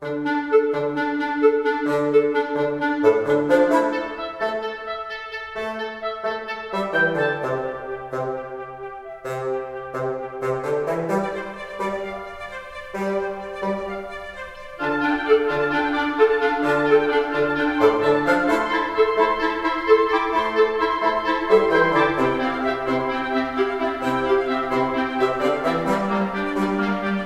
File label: woodwind breakdown